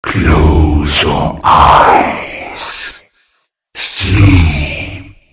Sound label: boss enrage; csgo; wcs go